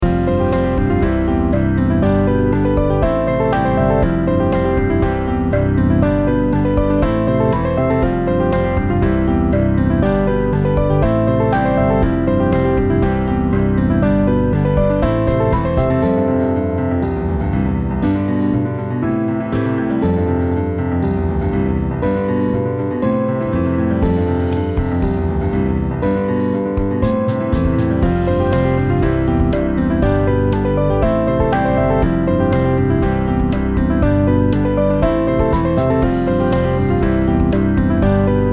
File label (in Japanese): OP・ED・街の風景などマルチなBGM